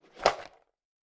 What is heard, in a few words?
plastic lid open